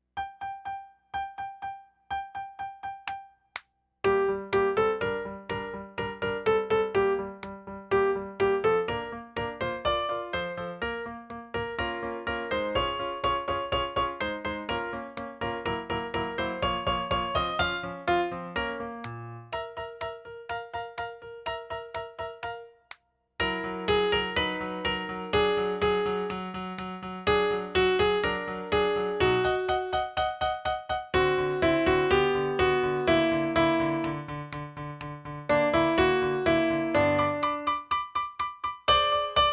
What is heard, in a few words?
Piano @ 124